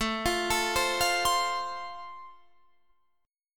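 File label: A Minor Sharp 5th, Guitar Standard, chord strum; Am#5 arpeggiated; 17,20,19,17,18,20; JGuitar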